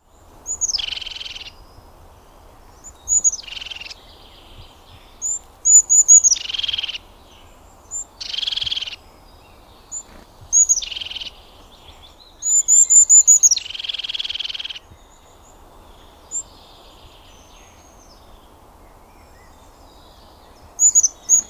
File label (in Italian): Cincia Dal Ciuffo